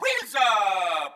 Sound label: Wheels Up; MK8; Metal Mario